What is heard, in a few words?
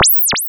se warp